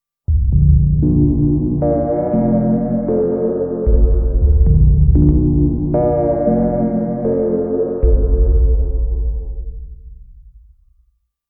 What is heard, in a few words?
Moon Chimes